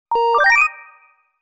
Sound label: SMS Tone